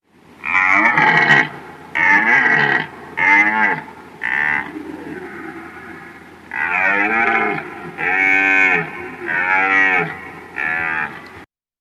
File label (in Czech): Jelen obecny